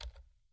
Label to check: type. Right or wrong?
Right